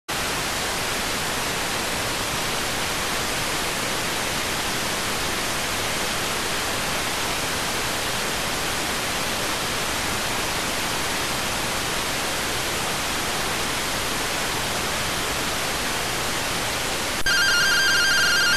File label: rtty rx